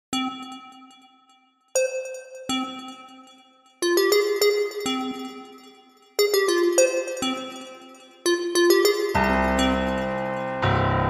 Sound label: 203 BPM